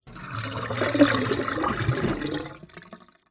household kitchen sink metal water drain plug hole short gurgle